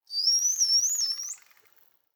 dolphin idle water; mob; Minecraft

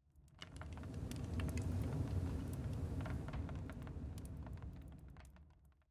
blast furnace; Minecraft

